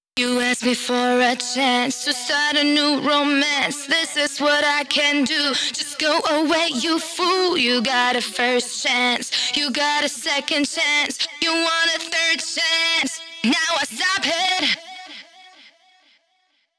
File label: Female Vocals Vers WET, 128 BPM; Vengeance Samples; VTDS2 Song Kits